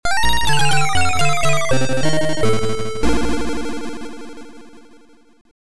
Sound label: Game Over; Jet Set Steamboat Willie 3